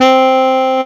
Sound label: Casio CTK-611 Tenor Sax C4